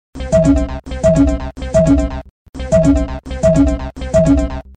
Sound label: Futuristic Ringtone